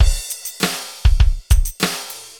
ROCKS, ROCK POP DRUM; MAGIX

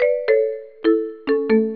Lose

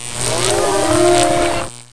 howl die; THEXDER

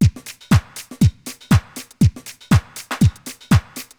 BEAT, DANCE DRUMS; MAGIX

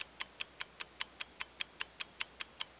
TIMER; KRONDOR

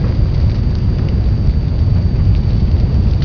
FIRE LOOP

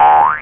Boing

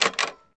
TYPE

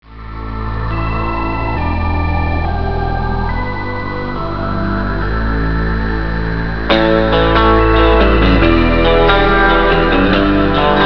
TitleMusic; VAUX-Killer

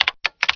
CLAV

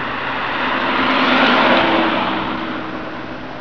AUTO; 999wavs

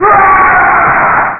yell

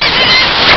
kiss, human

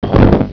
HEAVY CR

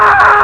AUGHH

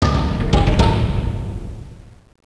SLAMMER

